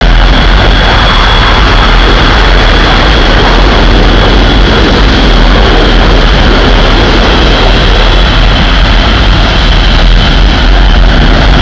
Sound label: Rocket fly